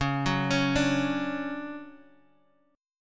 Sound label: D Major 7th, chord